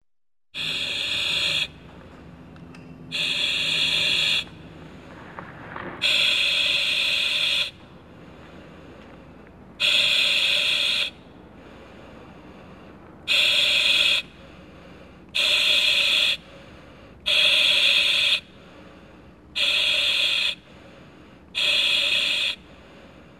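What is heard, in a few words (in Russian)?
дыхание через кислородную маску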